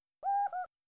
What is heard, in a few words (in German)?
vor abgrund; jazz jackrabbit 2